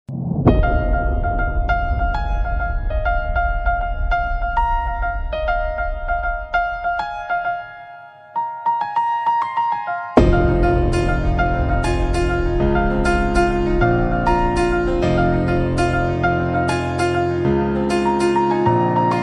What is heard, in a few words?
instrumental ringtone song telugu; The Raja Saab; prabhas; nidhhi agerwal; maruthi